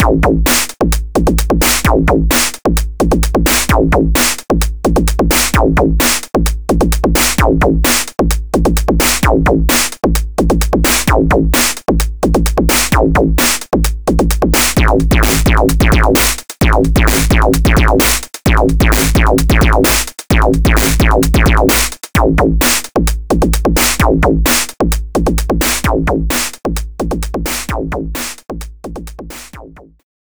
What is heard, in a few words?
BoomBox Track loop